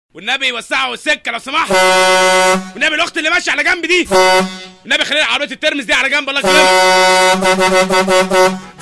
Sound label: Horn